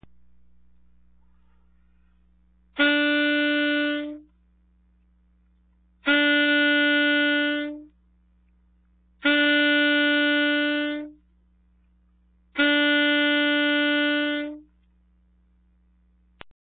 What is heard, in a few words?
attack, sax